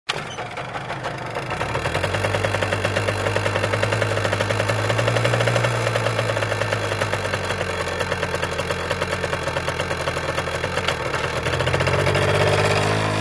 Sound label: Motorcycle starts, waits, drives away